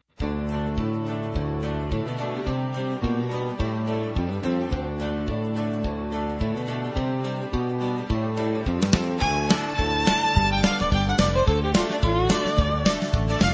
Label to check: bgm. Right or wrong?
Right